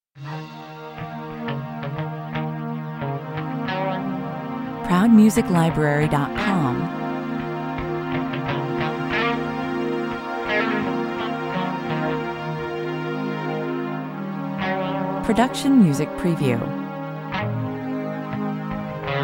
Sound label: A bluesy guitar that plays fills with a synth pad, 86 bpm